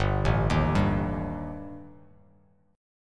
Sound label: G-Suspended 2nd Sharp 5th-G; Gsus2#5 arpeggiated; JGuitar